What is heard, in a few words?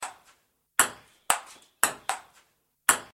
ping pong sms